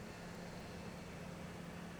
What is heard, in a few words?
pulses, BALAENOPTERA; MONACO 2022-07